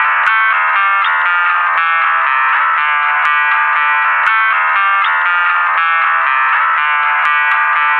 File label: 120 Acoustic Radio Sound